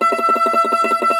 cumbus saz E, plucked strings; E-MU Producer Series Vol. 7 – Old World Instruments

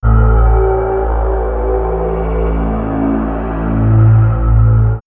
Swirls, pads; OnLand; X-Static Goldmine

